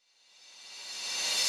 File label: Cymbals Reverse Crash; Vengeance Samples